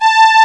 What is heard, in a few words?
Str Sect; SoundMusicSys; Falcon Music